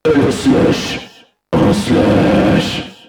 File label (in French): JINGLE